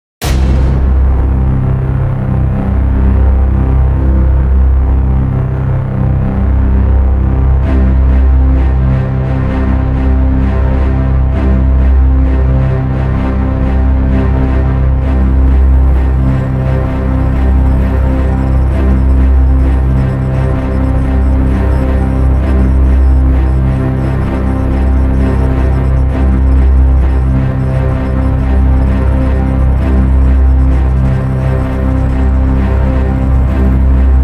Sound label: Music for game video intro; Mantra Studio